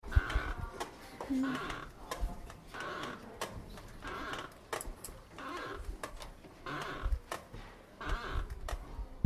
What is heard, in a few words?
creaky door